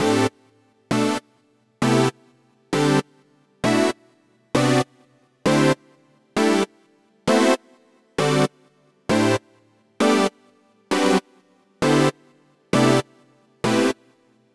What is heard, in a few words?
Poly Stacks; super crush k.o.